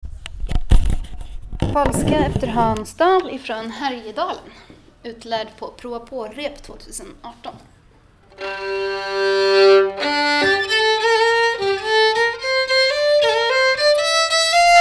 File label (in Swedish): Polska efter Hans Dahl melodi